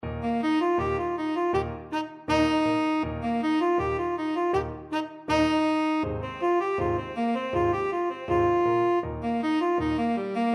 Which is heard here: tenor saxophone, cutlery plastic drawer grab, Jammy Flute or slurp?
tenor saxophone